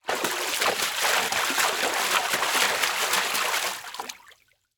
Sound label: Liquid Water Water Rapid Splash